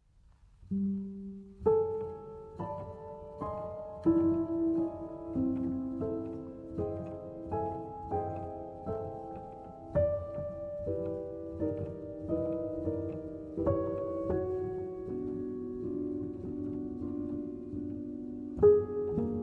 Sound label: Upright Piano